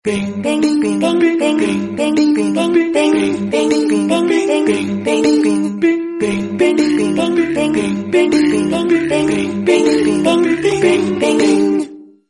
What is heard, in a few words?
bing bing sms tone; bollywood